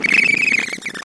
Bird Yellow Special; fowl

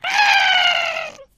Bird Black Flying; fowl

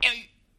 Pigs Collision, Small And Medium; fowl; Pigs Set1

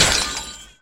Ice Damage, Ice Blocks; fowl

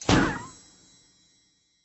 Easter Cloud Destroyed; fowl; Easter Blocks

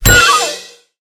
Cherry Center Destroyed, Blocks; fowl

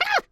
Bird Black Collision; fowl

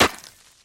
Ice Collision, Ice Blocks; fowl

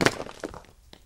Stone Collision; fowl

